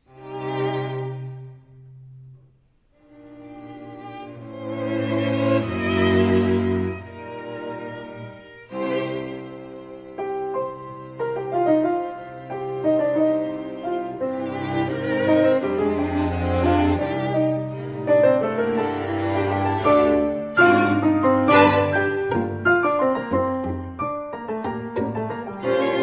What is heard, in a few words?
Piano Quintet Largo Allegretto